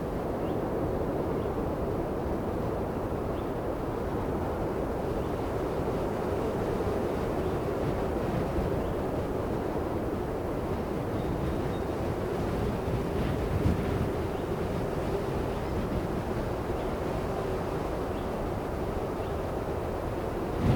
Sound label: AMB; DEMACIA